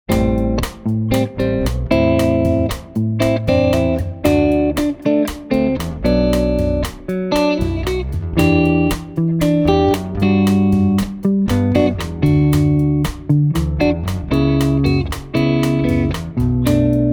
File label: Clean middle, pickups